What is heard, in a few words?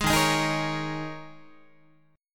Eb 7th Suspended 4th Eb, Guitar; Eb7sus4; strummed